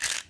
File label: pistol revolver spin